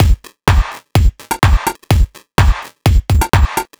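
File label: No percussion, Drum Loops 126bpm, Nu Dance Electro; Zero-G; Soundclash Nu Dance Electro; Acidized Wavs; Dubnobass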